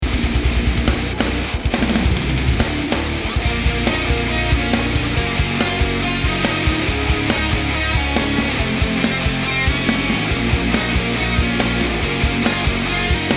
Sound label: Solo